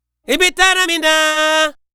African Harmony Vocal; Continental Drift Aftershocks; Vocals

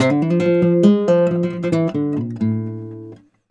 Flamenco, Guitar, a

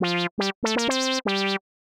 120 Mono Synth, Keys, Nu Jazz House; PowerFX

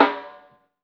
SHUFF SN

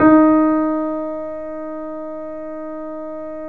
D#, The Piano; InVision Interactive

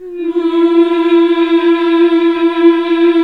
MMH E2, CLASSIC VOX

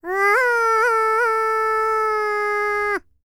PERSIAN, World Voices; ILIO; Vocal Planet VOL-5

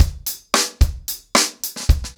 Hip Hop 110BPM, acoustic drums drumloops

